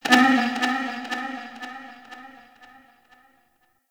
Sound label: Ship Energy Bounce; Warframe; Corpus Ship Remaster